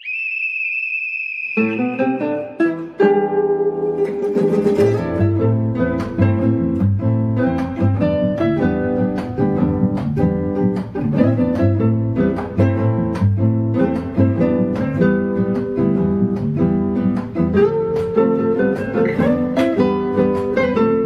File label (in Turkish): Gitar